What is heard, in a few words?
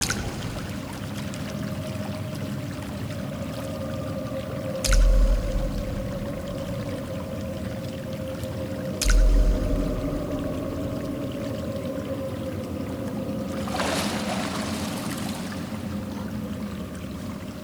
water, ambience; Kyuubi